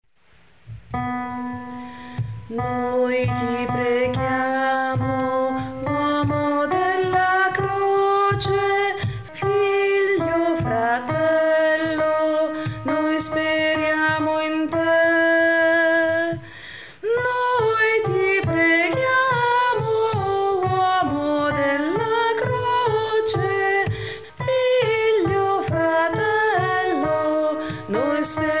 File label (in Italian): contralti